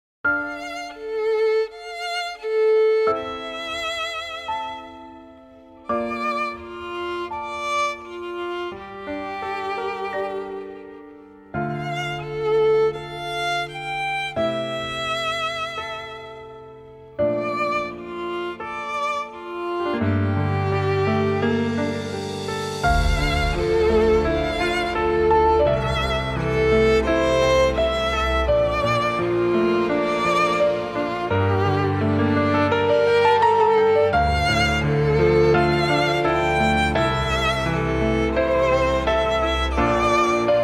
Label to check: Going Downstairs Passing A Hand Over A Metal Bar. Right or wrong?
Wrong